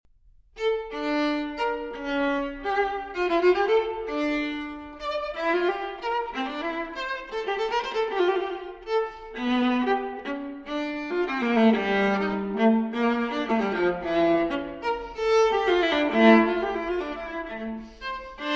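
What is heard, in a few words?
Cello Suite No. 2 in D Minor Transcription For Viola Gigue; J.S. Bach; BWV 1008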